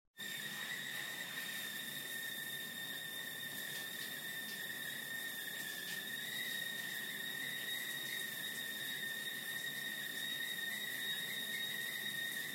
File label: shower; katsucon 2015